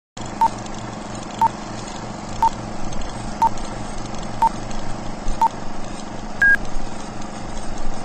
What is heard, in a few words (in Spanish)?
Efecto Intro De Película Antigua Con Sonido